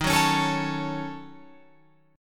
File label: D sharp Minor 7th Flat 5th, Guitar, Open F; strummed